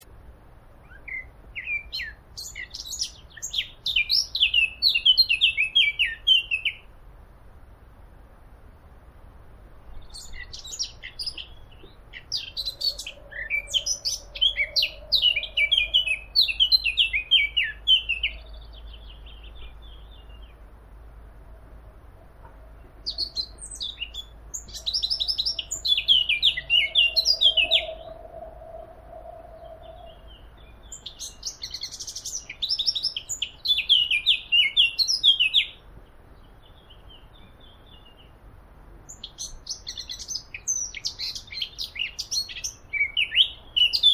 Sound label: Sylvia atricapilla; SETE